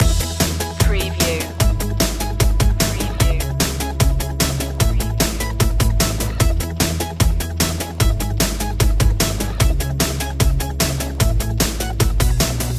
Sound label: sparks of light loop